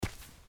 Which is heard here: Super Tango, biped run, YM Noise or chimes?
biped run